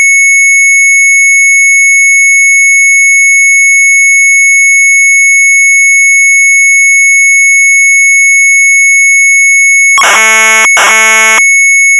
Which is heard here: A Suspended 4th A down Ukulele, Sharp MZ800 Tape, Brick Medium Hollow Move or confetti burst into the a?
Sharp MZ800 Tape